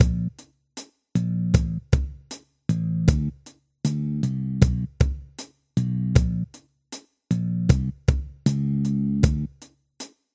bass tracks

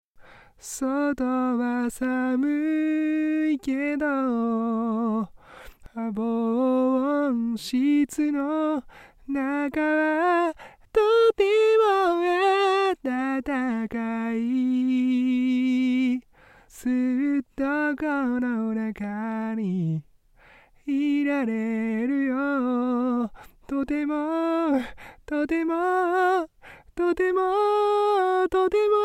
vocal

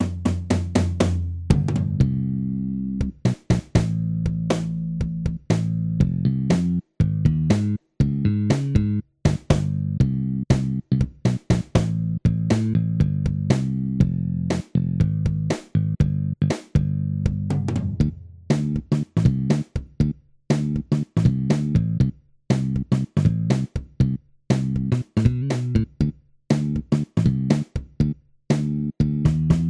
bass

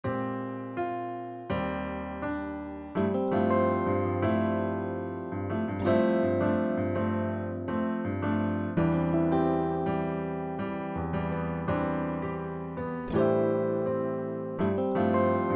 guitar

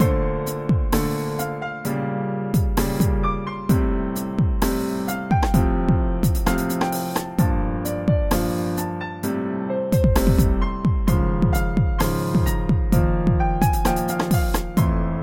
drum

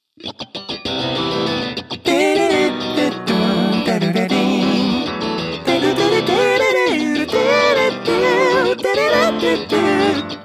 hamo comp

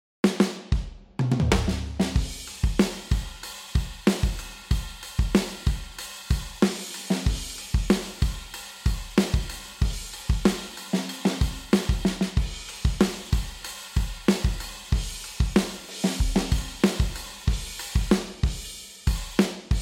first sabi drum after

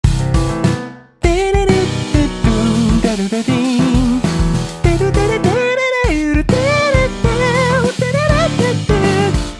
mute guitar

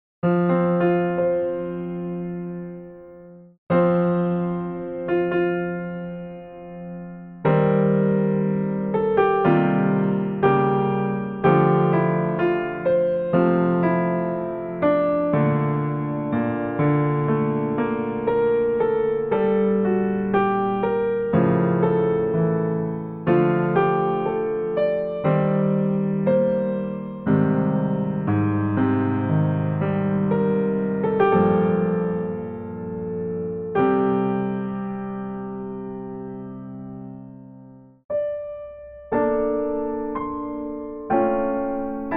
piano solo

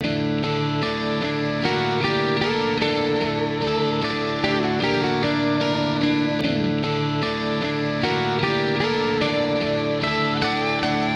guitars

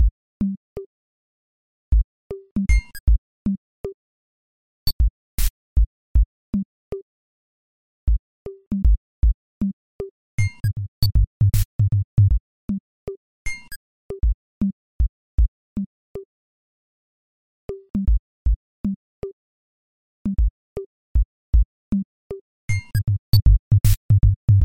drum

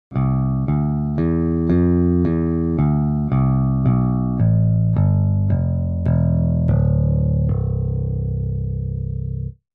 bass

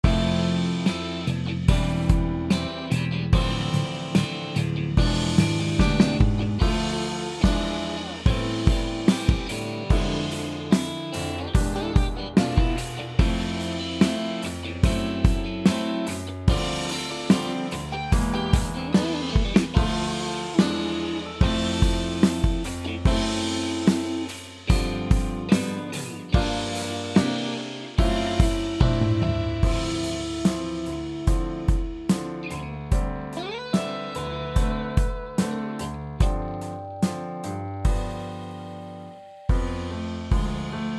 ballade

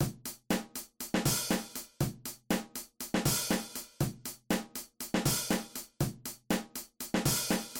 Basic Rock

